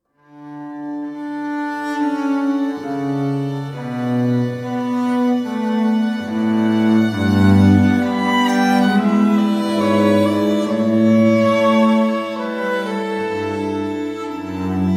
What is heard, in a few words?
Air on a G String